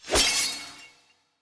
Bonus Slash; District Samurai

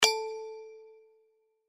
BTN Bet Per Line PLUS; Zodiac Millionaire

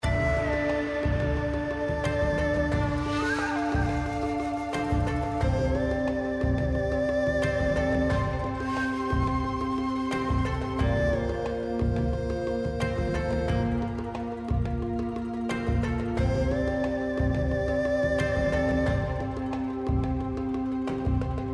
BGM Free Spin; Kungfu Showdown

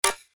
Bonus Click; slots; Wildlife Adventure

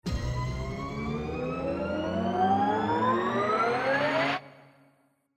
Anticipation Scatter, SLOTS; Bombo

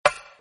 Reel Spin Stop, slots; Symbols Of Asia

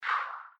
SFX BOX REVEAL; slots; Lucky Wok

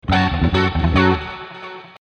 Big Win; Kungfu Showdown

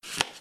Number Selection; slots; Wealth Gods2

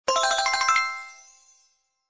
win Wild 5x; Paradise Escape

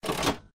lever; SLOTS; Cyber Angel

